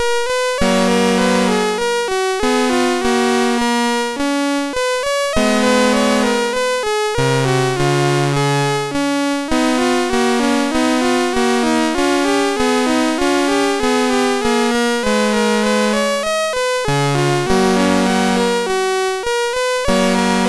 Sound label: Digi Hits, MOS6581R3